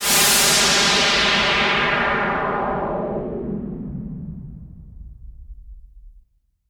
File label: Whoosh Down Dark